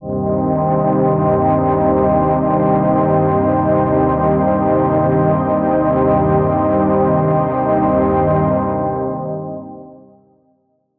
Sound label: basic minor